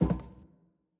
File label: Footstep Metal